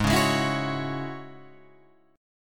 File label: Ab 7th Suspended 4th Sharp 5th Ab down Guitar Open F; Ab7sus4#5 strummed